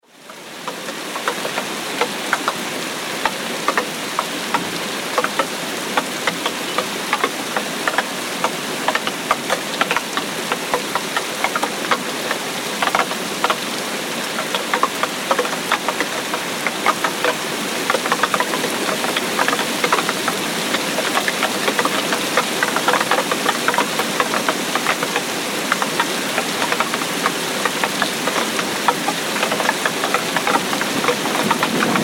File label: Tropical Rain Storm, Thunder; weather